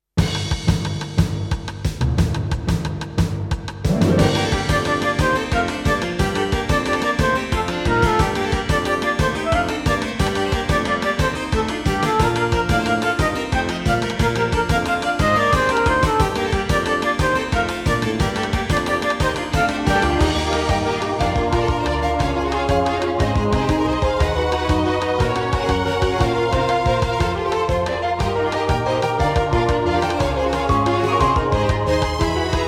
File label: Bird Jig